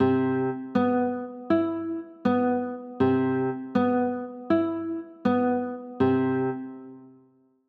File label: c maj7